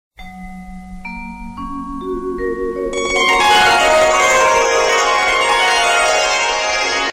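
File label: Chimes Message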